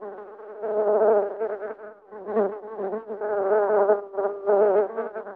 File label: bumblebee